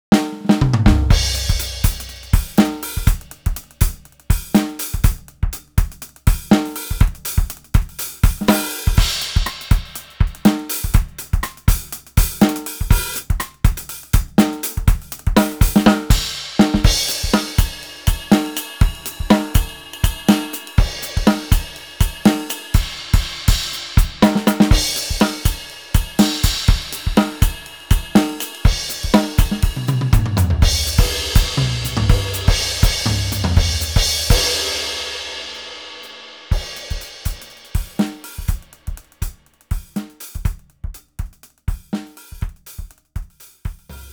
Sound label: efnote 7 vintage coated 70s